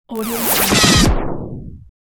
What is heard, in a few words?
vinyl slam